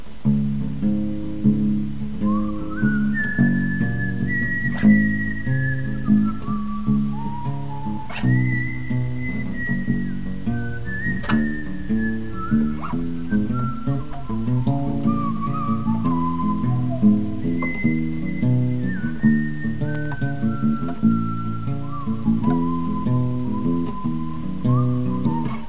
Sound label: bass whistle